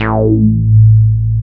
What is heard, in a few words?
bass